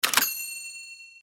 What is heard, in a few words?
record